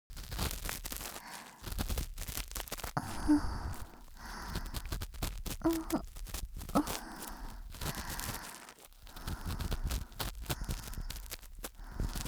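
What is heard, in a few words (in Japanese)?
【癒し】耳かき（右耳）奥をゴリゴリ; 癒しの音 詰め合わせ♪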